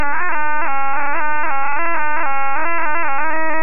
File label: haaagaaagaaagaaaaaaa